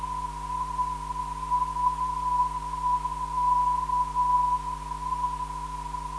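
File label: non-directional beacon; HEK; 344kHz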